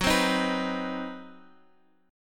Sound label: C Minor Major 7th Flat 5th, F sharp, chord